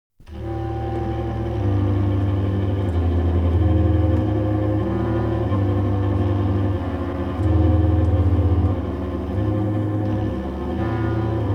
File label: suspense neutral